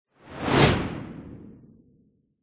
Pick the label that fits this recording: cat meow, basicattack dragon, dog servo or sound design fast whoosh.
sound design fast whoosh